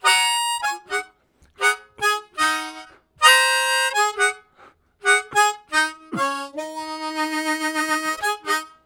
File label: Chords Gapped, Harmonica Riffs; Zero G